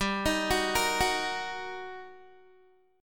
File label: G Minor Major 7th Sharp 5th, G, down, Guitar, 4E 4A 5D 5G 5C 6F; GmM7#5; arpeggiated